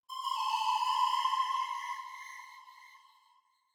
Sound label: ACCENTS 156 E Minor; Baller; BBoy Maniac; bboy accents phrases